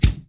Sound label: clear steel kick drum d key